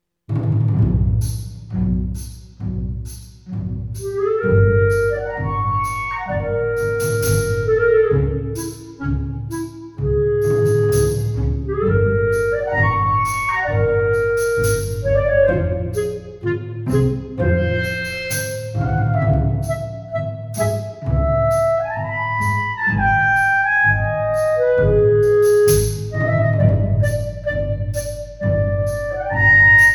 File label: Eb clarinet